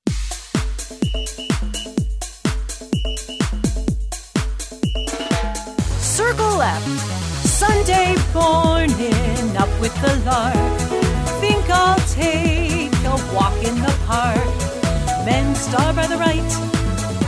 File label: vocal sample